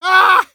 hit; Escape from Tarkov; scav6

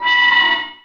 Amb Constructions

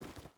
footstep boots forest walk; Rust

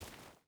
footstep barefoot stones walk; Rust

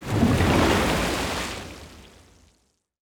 depthbomb; World Of Warships; weapons

